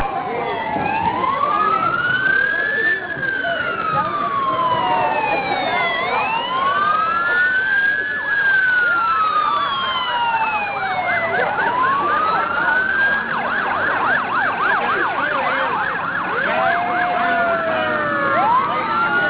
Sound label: siren multi